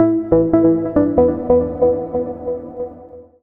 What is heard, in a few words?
TECHNO EXTRA 140